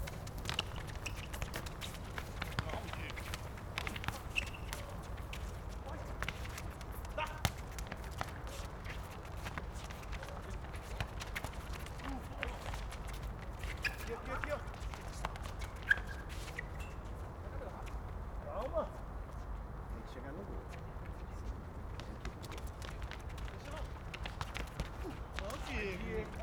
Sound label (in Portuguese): Quadra de futebol grito de gol vozes masculinas vozerio de espectadores; Cerrado